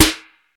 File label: verby snare single hit D# key, Royality free snare tuned to the D# note.